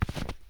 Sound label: step snow